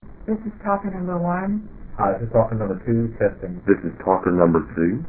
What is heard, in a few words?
table mic